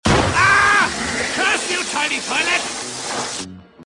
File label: Tiny Toilet